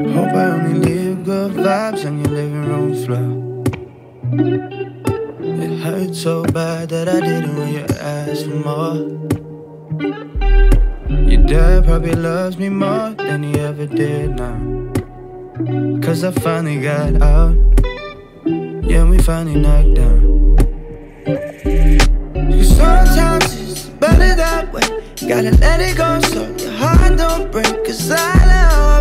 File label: English Ringtone